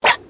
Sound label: jump